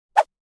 Fade in

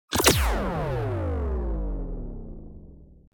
Sci fi weapon plasma sniper rifle single shooting

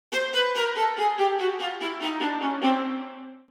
Cartoon funny descend

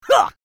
Mobile game character attack shout; video game

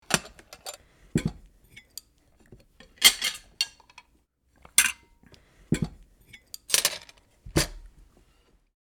Dinner table being set plates bottles and glasses

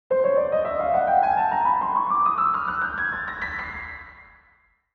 Cartoon ascend piano scale

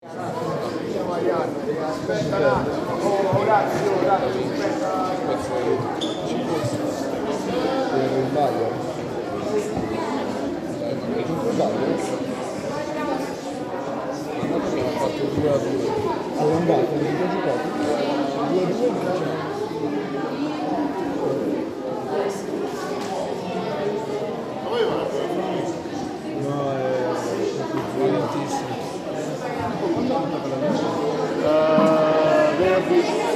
Museum entrance light and wandering with voices

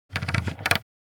Radio volume control knob handling

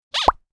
Cartoon idea pop

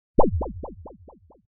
Cartoon bounce; boing

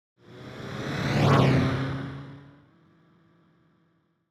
Sports car passing by fast

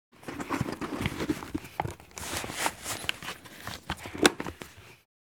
Motorcycle helmet putting on

